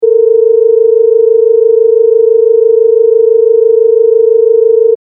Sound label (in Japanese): 440-466（26）（増1度）